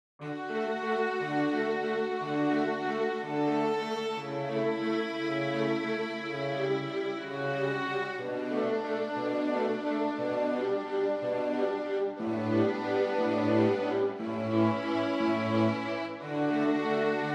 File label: string